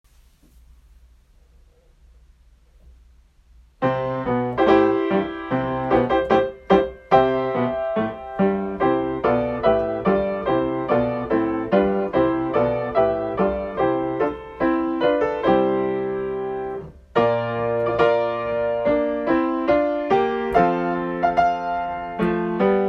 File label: fanfare